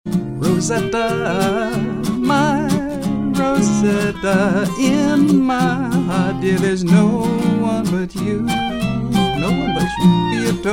tin pan alley trio

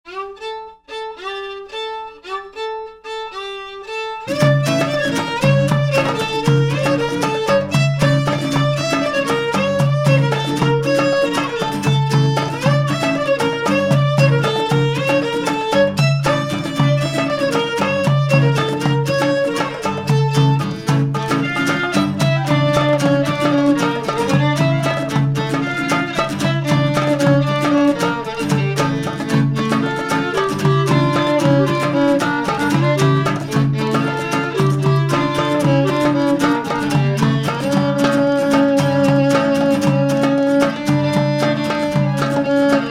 greek quartet

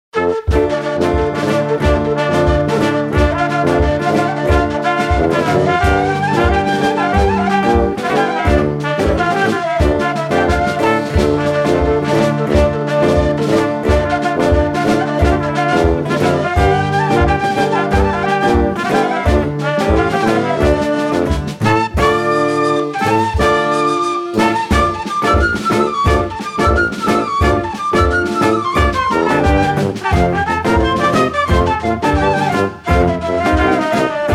klezmer quintet

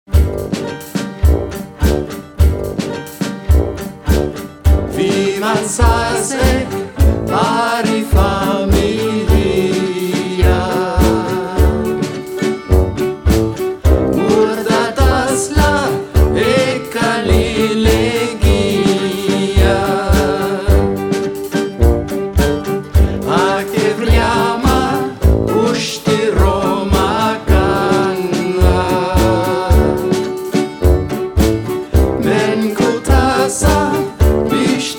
gypsy quintet

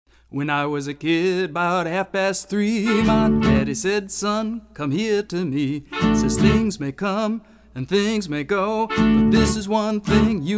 swing quintet

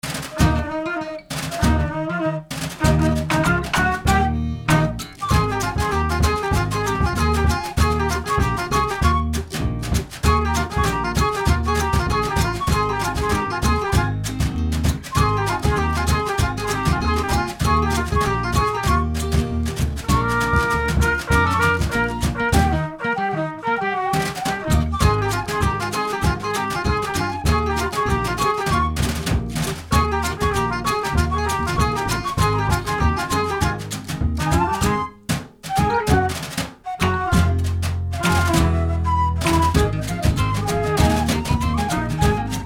rag dixieland sextet

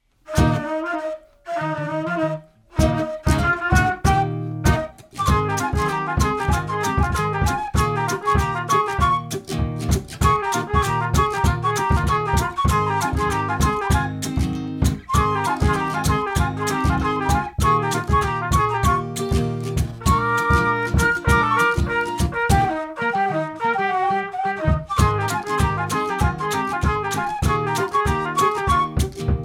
dixieland trio

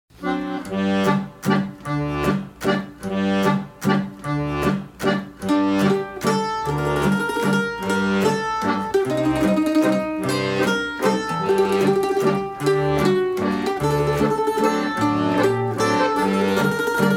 scandinavian trio

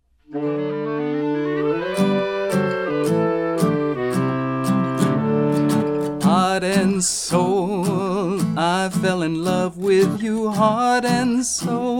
foxtrot quintet

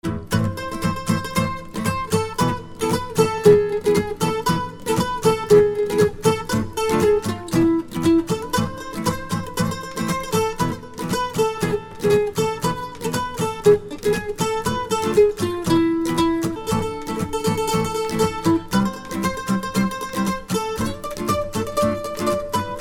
debka israeli duet

